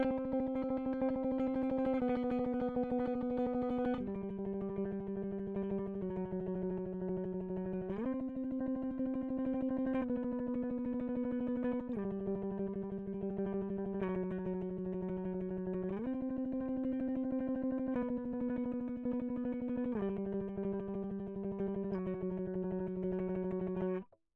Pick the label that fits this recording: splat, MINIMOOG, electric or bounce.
electric